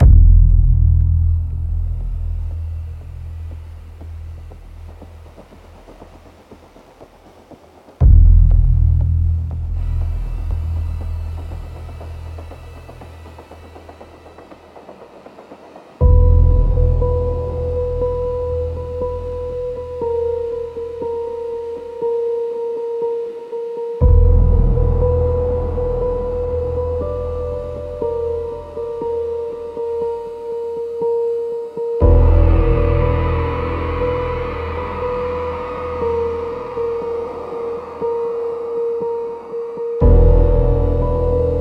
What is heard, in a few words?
hallway unknown a subtle thriller ambience soundtrack; suspense; Unseen Presence